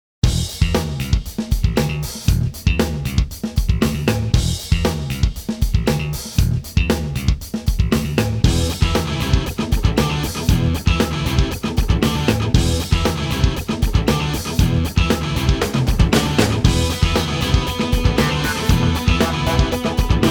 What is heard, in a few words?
Pop Punk Instr